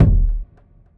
long room reverb kick single shot d sharp key; kick drum samples

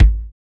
dope steel kick drum sample g sharp key

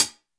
Clean Foot Hi Hat Sound D#; hi-hat one shots; hat samples

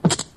revolver click

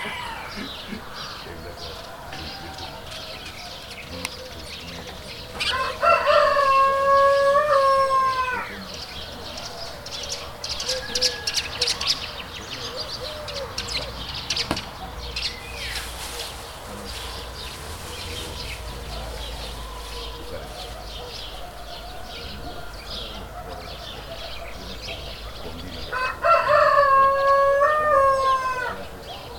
farm animals in natural habitat with birds; soundscapes

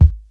clean kick single hit d sharp key; kick drum samples

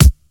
old school rap steel kick drum c key

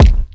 clear kickdrum c key

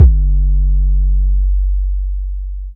rich low end TR-808 drum machine kick F sharp key

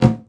clean tom drum sound a key

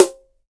nice snare drum f sharp key

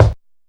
kick drum one shot c sharp key

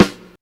dark steel snare drum sound G key; snare drum samples

